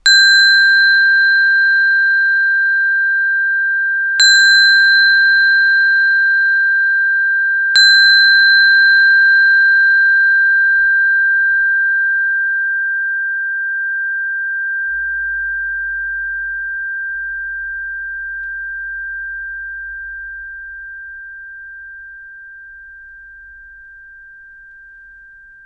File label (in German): Glocken; Perc-Effekte; steinklang